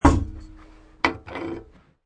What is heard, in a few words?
Metal Tool Bang Wood, Metal tool bangs on wood.; Objects